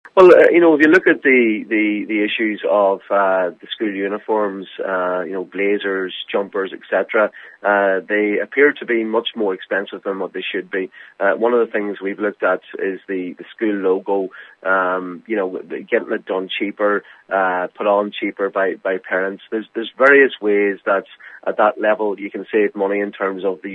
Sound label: pad